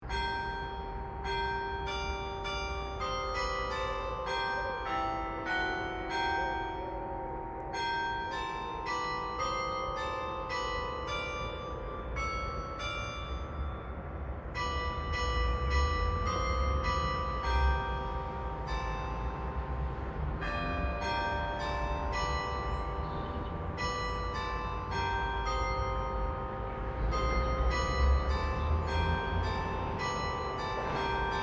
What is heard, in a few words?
Glockenspiel